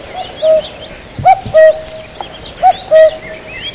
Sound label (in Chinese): 布谷鸟; 布谷鸟，布谷布谷叫